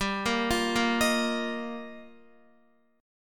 G Minor 7th Sharp 5th, Guitar; arpeggiated; x,10,10,10,10,11; 4F,4A,5C,5G,5C,6E; JGuitar

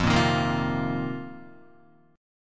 F 7th Suspended 4th F down Guitar

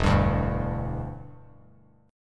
E Minor 7th Bass Standard; strummed

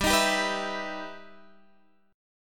E Minor Major 7th G

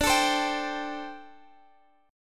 A Minor 7th D; strummed

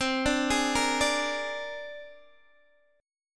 C 7th Suspended 2nd Sharp 5th, Guitar, Open D

